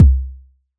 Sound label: Kick, Drums; Bitkits